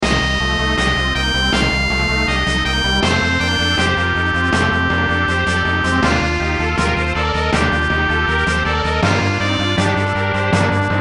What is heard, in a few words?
wonderful transportation snes